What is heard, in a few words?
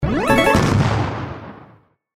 Icon Explode; Bouncy Balls 2